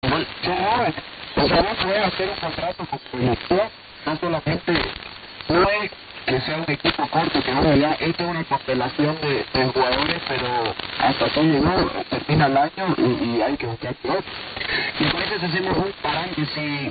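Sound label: Radio Bolivia with distorted audio; 6134.8 Radio Santa Cruz; Lamas Peru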